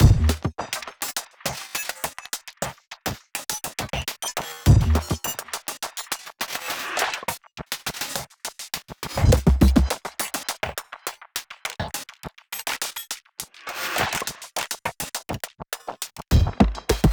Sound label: music drums Fight; music ftue drumsFight 04